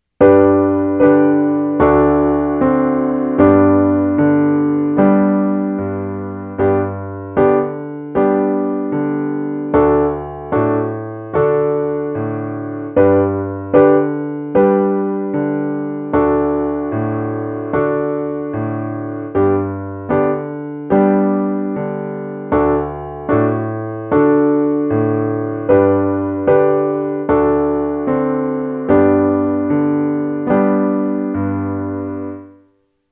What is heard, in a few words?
First Piece, Soprano Recorder; Guderian - Playing the Soprano Recorder